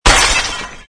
jar smash

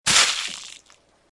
splattt

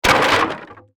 wood smash

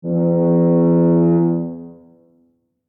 fog horn

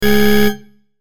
error in the file system

